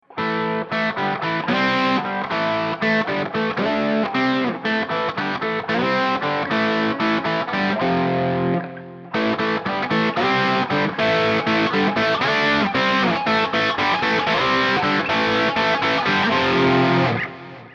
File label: picking dynamics